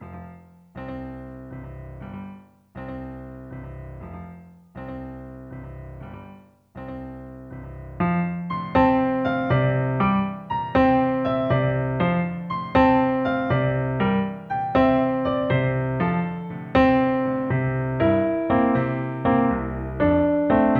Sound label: Accomp